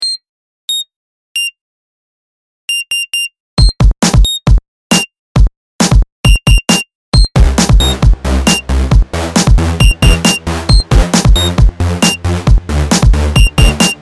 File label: beep song